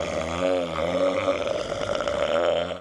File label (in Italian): Verso Zombie